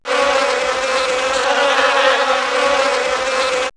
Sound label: Nature, Flies; X-Static Goldmine